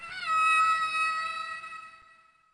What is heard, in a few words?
Roar; Biollante Rose; Heisei